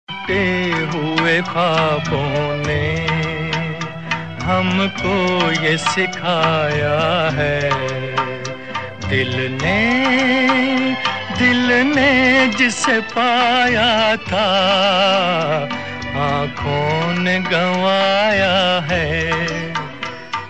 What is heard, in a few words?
Hindi old Sad Ringtones; Hindi Movie Ringtone; MADHUMATI